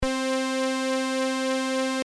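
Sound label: funk brass, vco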